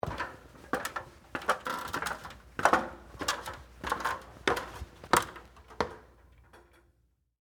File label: Metal Ladder Up, Industry, Metal, Ladder, Walk, Up, Feet, Perspective